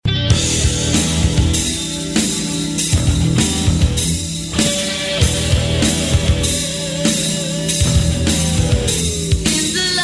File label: Crying